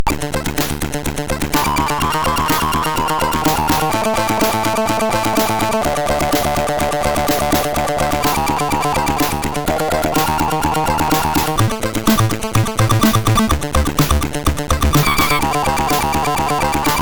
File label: Hires Demo Kit, CSG8580R5